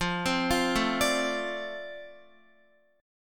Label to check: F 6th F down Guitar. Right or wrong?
Right